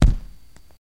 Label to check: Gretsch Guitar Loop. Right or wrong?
Wrong